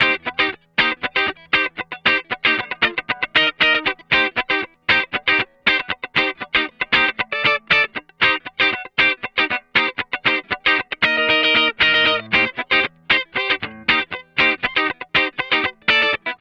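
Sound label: 117 Cm DISCO guitar, 117 BPM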